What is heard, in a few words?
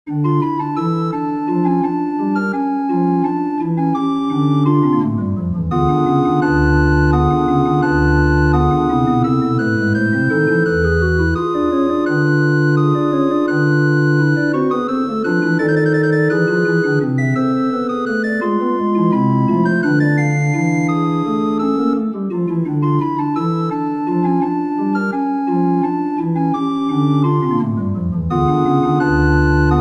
scherzo minor for pipe organ; Johann Sebastian Bach